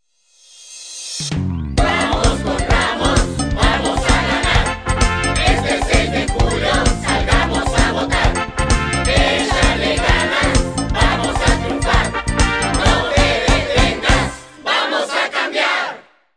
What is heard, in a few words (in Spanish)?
jingle con musica; porra